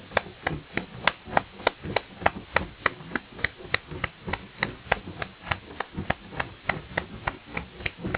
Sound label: percussion